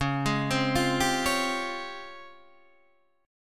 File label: Db Major Sharp 11th, Guitar DADGAD